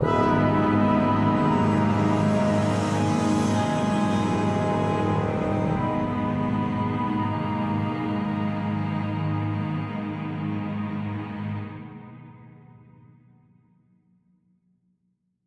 Tension - Relic Stinger

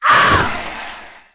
Monster; Conquer Online 2.0

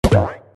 Ploink

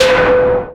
Character Hit

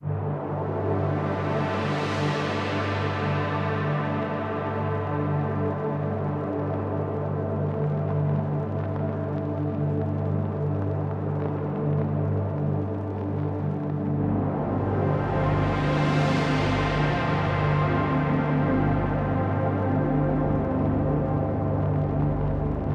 City Ruins Stem; Epitasis

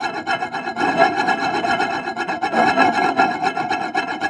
prize idle plates; Ringling Bros. and Barnum & Bailey; Wii

